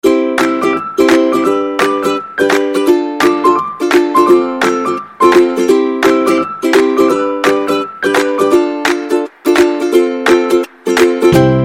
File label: glockenspiel fun energetic positive claps bells kids music